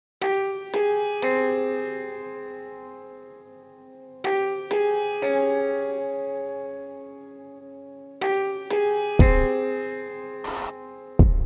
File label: Creeping Distress